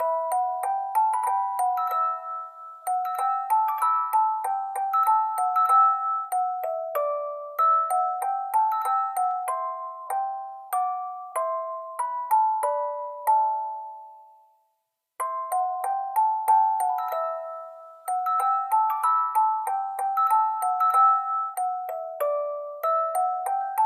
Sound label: music box; the sims medieval